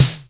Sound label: SILLY SNARE; Q Up Arts; Dream Experience